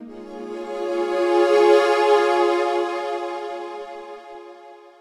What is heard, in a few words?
Pad; Dr Watson Pad; Proteus